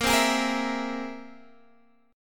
C Augmented 7th Bb

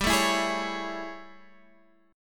A Major 7th G

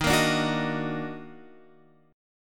D sharp Minor Major 7th, Guitar Open F; strummed

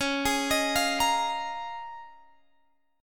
C sharp 6th Add 9th, Guitar, Open G; down; chord shape

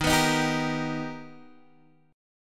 B Augmented Major 7th Eb, chord

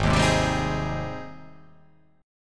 G sharp Major 7th G sharp

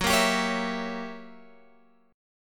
Gb 7th Flat 9th Gb Guitar Open F; 18,17,19,20,19,x; Listen to Gb7b9 strummed

